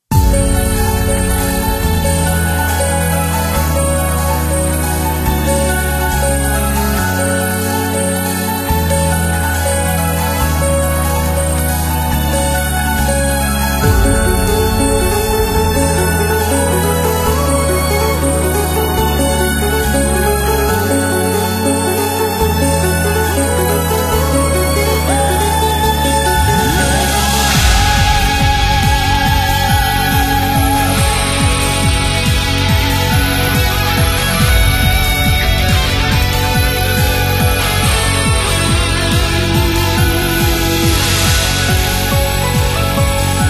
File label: Battle Dawn loop; Drago Noka; PS4